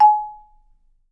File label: G#4, Percussion & Harp; Miroslav Vitous